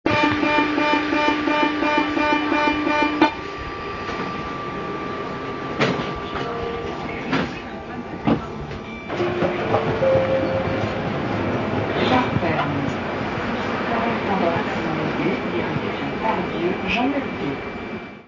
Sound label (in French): ambiance metro 2